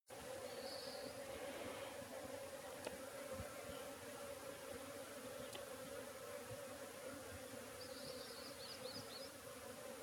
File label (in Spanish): abejas